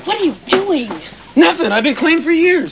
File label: clean; What are you doing?; Nothing I've been clean for years